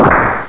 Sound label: mine dropper; HAVOC; RB Sounds